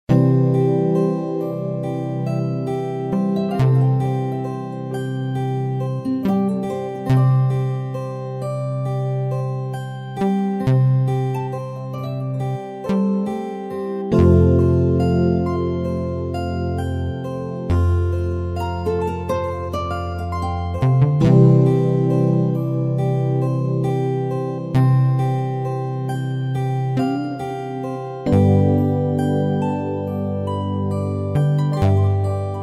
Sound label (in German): Märchenhafte Entspannungsmusik